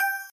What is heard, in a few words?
ding; fruit shop christmas